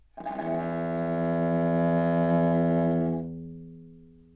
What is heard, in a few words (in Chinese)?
佤族独弦琴 空弦音 自然衰减 力度中强 推弓